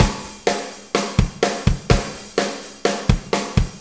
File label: Soul Deep, Drum Tools, Loops; Sonic Foundry (Sony Creative Software); Loops & Samples Drum Tools